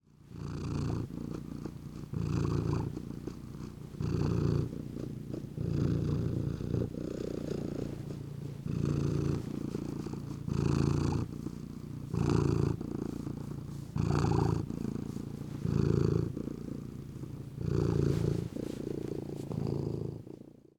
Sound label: cat sounds; Dora